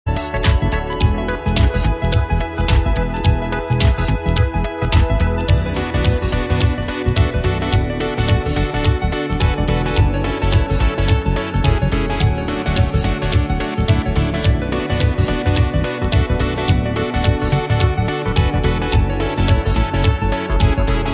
Heavy Punk

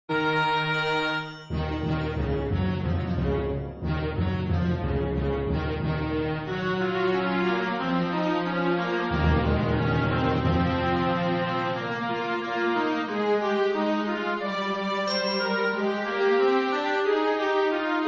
Symphonic; Bard's Tale 2

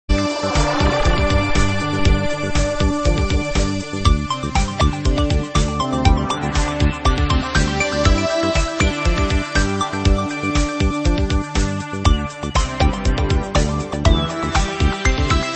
80's Style; Cybernoid II

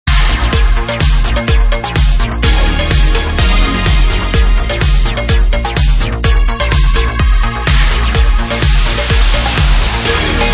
80s Disco Remix; Rolands Ratrace

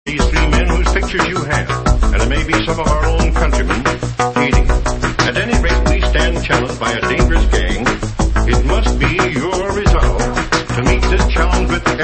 Basstrail remix; Mountie Mick's Deathride

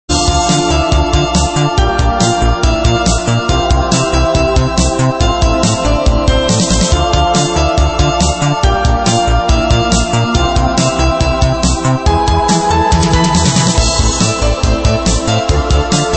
Piano; Grand Prix Circuit

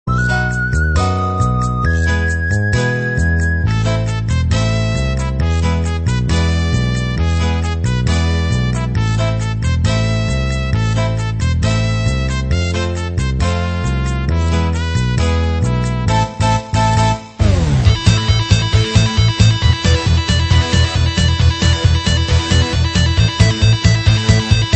Heavy Metal

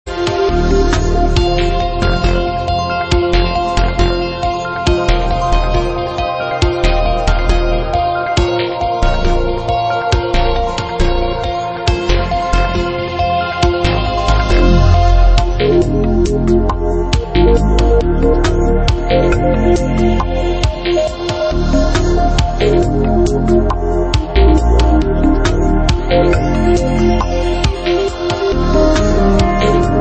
Slow-Electro; M.U.L.E

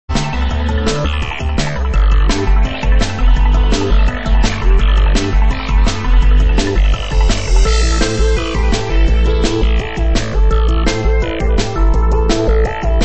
The Last V8 (Synthesized)

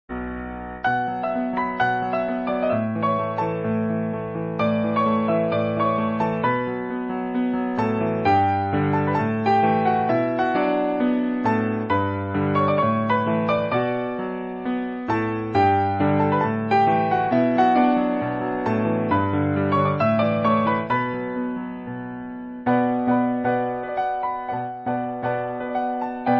Turbo Outrun on a piano

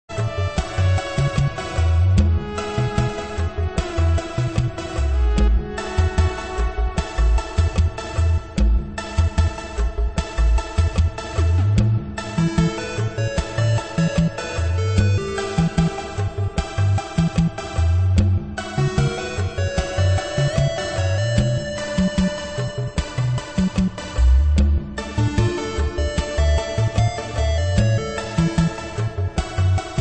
synth; Wizball

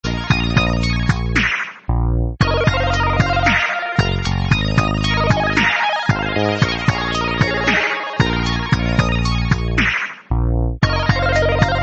Chiptune; International Karate

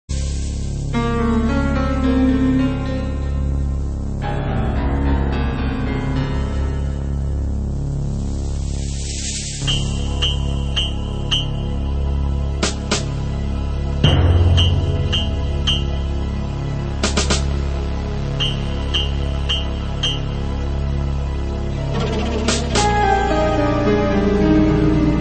Full Sci-Fi Horror Mix; Amaurote

